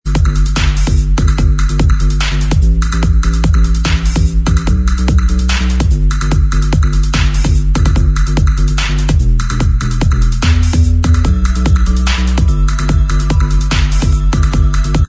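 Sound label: instrumental, backing track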